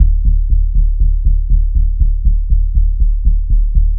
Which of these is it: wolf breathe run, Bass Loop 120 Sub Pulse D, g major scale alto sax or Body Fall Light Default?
Bass Loop 120 Sub Pulse D